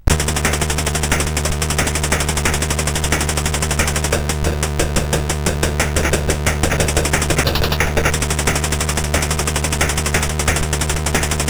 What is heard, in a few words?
sid CSG8580R5; Gyruss